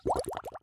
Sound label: brew